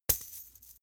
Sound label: chain mail impact medium